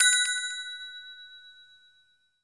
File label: ping